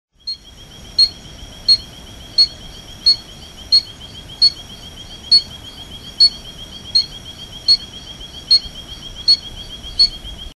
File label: Anodonthyla nigrigularis